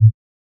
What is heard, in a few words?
menu; noteye; roguelike